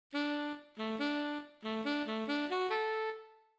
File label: nachtmusik; intervallen